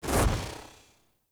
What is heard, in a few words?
UI Theme Close; Zephyr